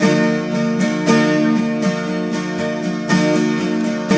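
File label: 12 string minor E2, guitars; Spectrasonics; Hans Zimmer Guitars VOL-1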